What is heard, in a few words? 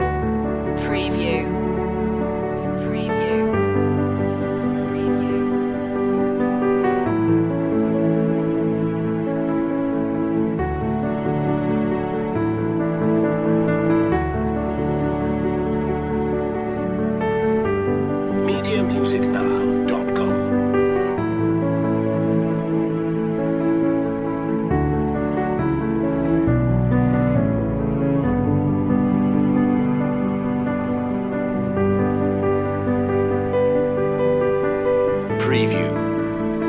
forgiveness loop